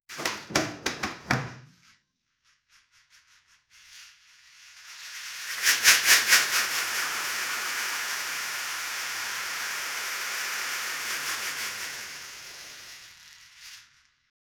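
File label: plastic door squealing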